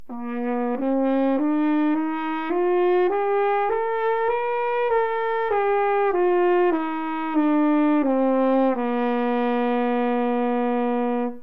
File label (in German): tonleiter neuetrompete